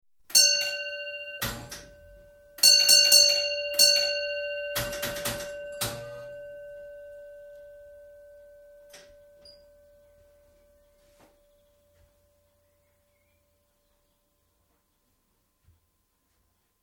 Railway Signalling Equipment, advance warning of train & return acknowledgement; BBC